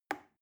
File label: plastic button little push soft